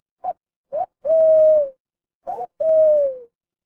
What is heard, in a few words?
animal owl